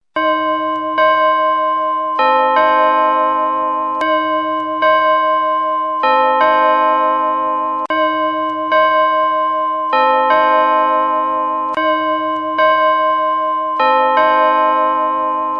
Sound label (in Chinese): 教堂钟; 钟声; 广播室铃声系统